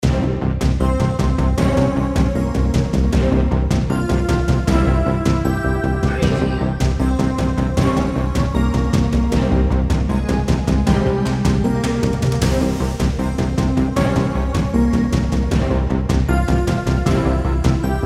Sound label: percussive synth; boss